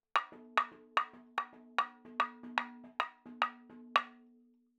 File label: 110 7 8 MADALAM FILLS, percussion; Sample Banks; MADHALAM; MADALAM 7 8 FILES